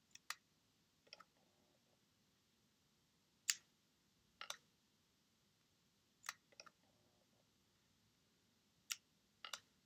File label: leica Q power on sound